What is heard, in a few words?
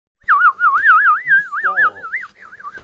whistle can you stop